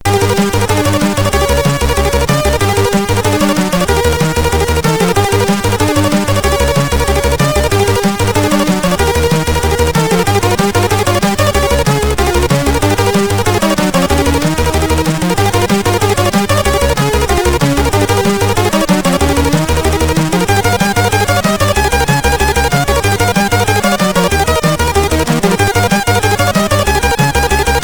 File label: Trebbler, sid CSG8580R5